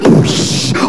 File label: Boosh, beatbox; live-beatbox